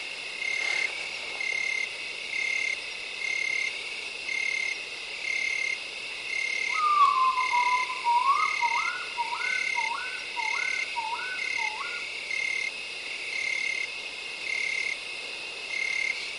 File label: nighttime